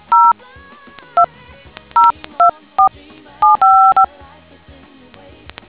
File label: dtmf